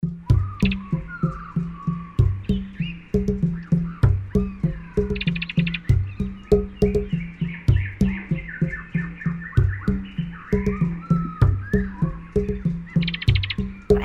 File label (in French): SANS VOIX